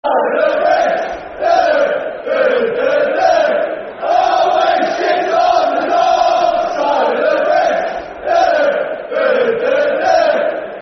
Always Shit On The North Side Of The Bridge [Football Chant (Soccer Songs)]; Scunthorpe United Scfc